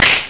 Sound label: Fight; 特酷声音素材库